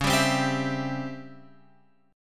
C sharp Minor Major 7th Flat 5th, guitar chord

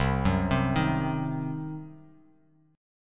C Diminished C, Bass Standard; arpeggiated; JGuitar

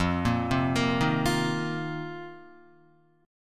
F 7th Suspended 4th F down Guitar; arpeggiated; JGuitar

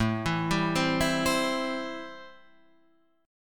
A-Minor 7th A Guitar Drop D

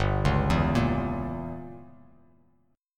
G Diminished 7th; arpeggiated; JGuitar

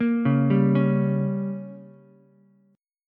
G Diminished, Ukulele; arpeggiated; JGuitar

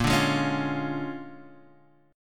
A sharp 7th Sharp 9th A sharp; strummed; x,1,0,1,2,1; chord; JGuitar

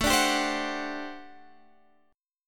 G Major 7th Suspended 2nd Suspended 4th A

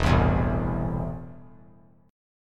E Suspended 4th Sharp 5th; strummed; JGuitar

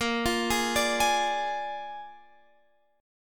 Bb 7th Bb Guitar Half Step Down, chord

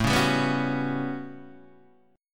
A 13th, Guitar Standard, chord; strummed

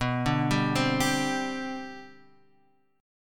C Suspended 2nd, B, guitar chord; C2/B arpeggiated

